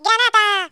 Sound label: grenade